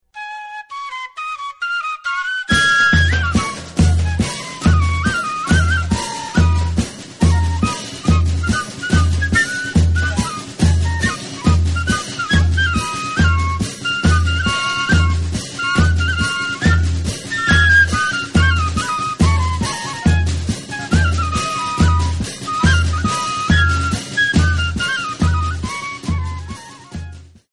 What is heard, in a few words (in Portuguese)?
Hino Nacional Brasileiro